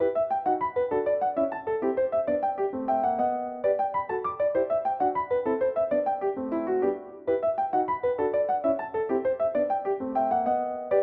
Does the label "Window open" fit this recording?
No